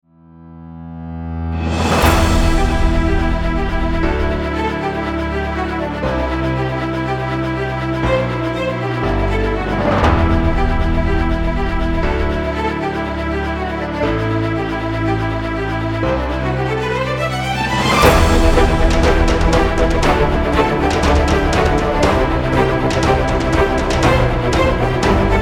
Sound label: Action Epic Rock Trailer; cinematic orchestral trailer is perfect for movies, action videos, war, fight, battle scene.